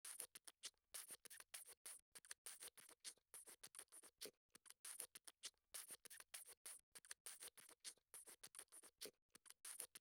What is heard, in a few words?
bbox